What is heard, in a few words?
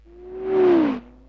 fast car